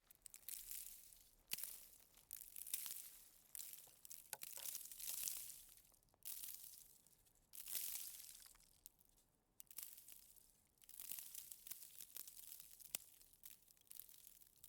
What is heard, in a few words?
hail or sleet falling